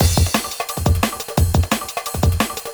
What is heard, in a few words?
175 D&B Drums; Pro Tools Essential Loops; Dance DJ; Drum n Bass